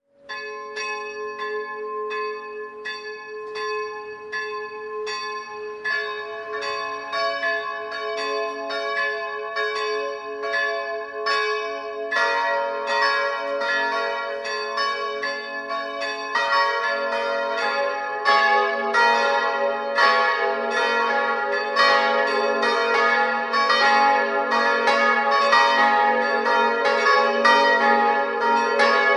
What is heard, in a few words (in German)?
Enkering, Glocken